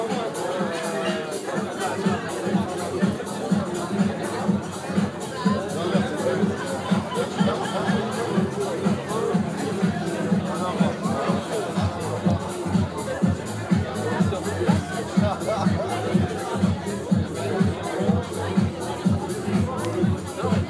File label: Outside Party